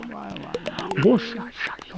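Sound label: Music Village Calm Inside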